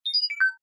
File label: achievement notify; Danger Mouse - Ultimate